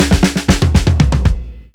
FILL, breaks, drums; Basement drums vol 2

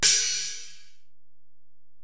Ride, Alesis DM-PRO, Drum Machines

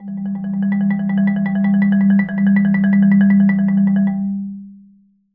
G2, Marimba roll; Sampleheads - Dave Samuels Marimba & Vibes

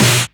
Snares; Vengeance - Essential Clubsounds Vol.4

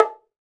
Bongo Lo, Perc; LA Perc; Akai MPC-500

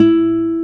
G E1, nylon guitar; AKAI S6000 CD-ROM - Volume 1; NYLON GT

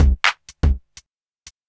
124bpm, House Perc Loops

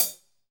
HH, Kit; Real-Kit

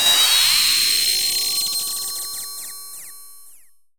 sfx sci fi; Masterbits - Switch FX

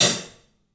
club hat; 3D Sound; 3D Drums

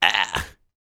A YELL, MALE HUMAN; ILIO; Vocal Planet

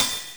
Open Hat (13484Hz); Sonic 3; M8; Genesis

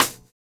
Stk SP, Roland MV8800, DRM MV-1200

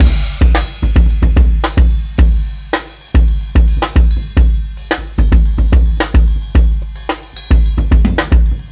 Marching Kit 4-Bar, Breakbeats; KJ Sawka; Drum n Bass & Beyond

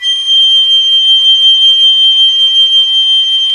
FLT PICOL, Flutes, Picolo& Flt

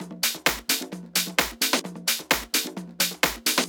Handy Tops, 130 BPM, Percussion; Sonic Foundry (Sony Creative Software); Underground UK House & Electro

